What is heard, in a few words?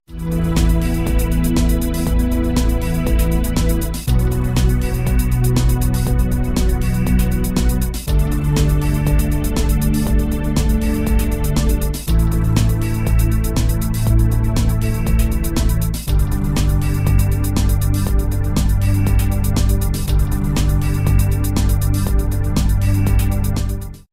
Pad Chords Ringtone